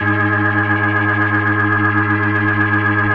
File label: B3, First Call Vintage Keyboards